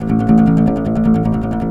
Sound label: guitar, Jazzmatazz loops